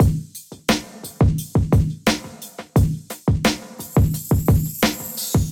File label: Kit 87 bpm, hip-hop drums; Golden Era; MIDI Clips; Dex Solar System; Sound Oracle